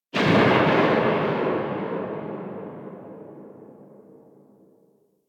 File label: Tail, Weapons; Strun Vandal